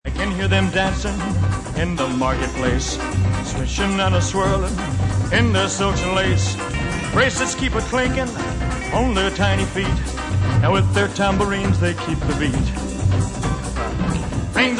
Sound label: tambourine